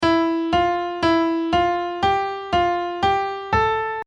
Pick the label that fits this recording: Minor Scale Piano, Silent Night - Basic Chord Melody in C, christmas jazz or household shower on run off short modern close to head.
Minor Scale Piano